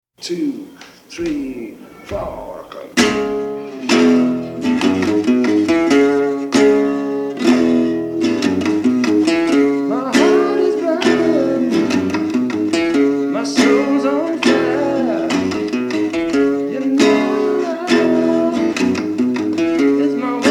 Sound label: Acoustic